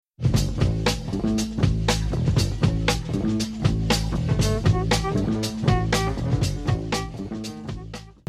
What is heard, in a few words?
Beat